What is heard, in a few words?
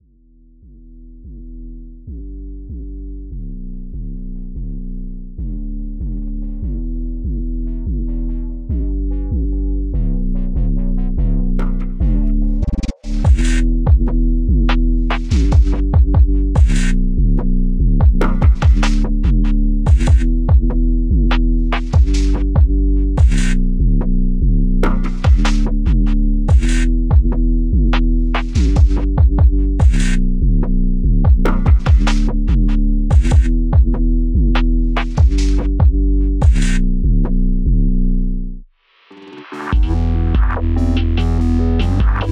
Open Access Instrumental